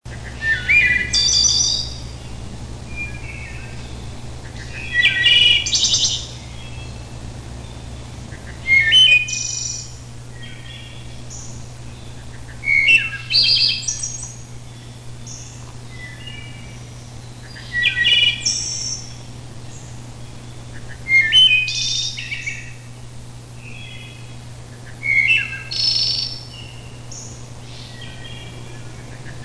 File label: wood thrush long; Aves